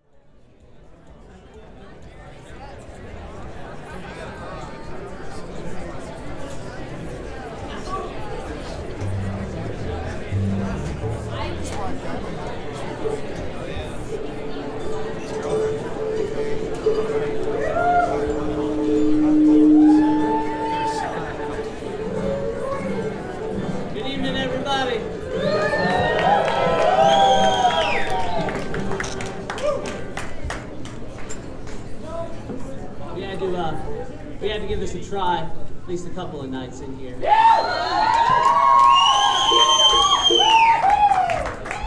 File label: tuning greetings; m200